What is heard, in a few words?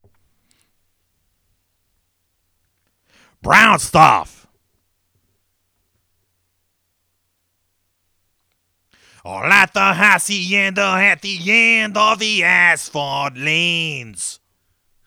vocal